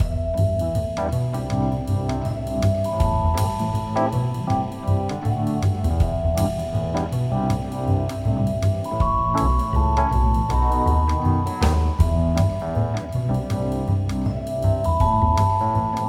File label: Elevator Music; Lego Marvel's Avengers; PlayStation Vita